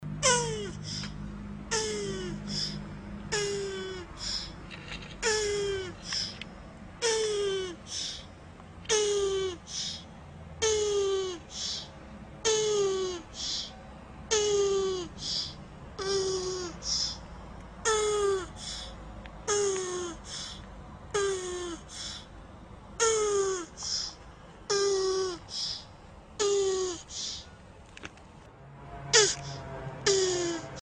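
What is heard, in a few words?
sparivanie cherepahi, turtle mating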